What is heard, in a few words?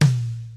high tom b key